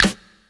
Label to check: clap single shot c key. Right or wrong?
Right